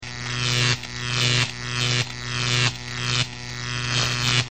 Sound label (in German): Elektronikdefekt; Haushalt